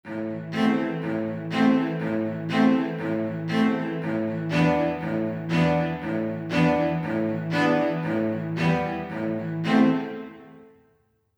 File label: cello surround; eastwest qlso